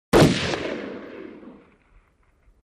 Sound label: Ar15 Automatic Rifle